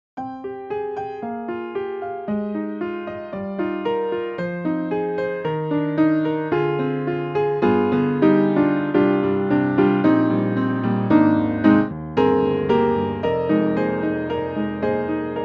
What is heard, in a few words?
Keys Modern Upright Wide Stereo; XLN Audio; Addictive Keys